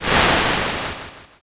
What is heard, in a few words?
swoosh